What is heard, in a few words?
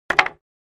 2x4 Plank Dropped On